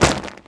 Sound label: umbrella hit; bouncyobjects; Tony Hawk's Project 8; XBOX